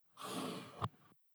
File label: question answers slide